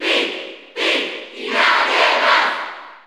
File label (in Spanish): Cheer Spanish; Pit; SSBU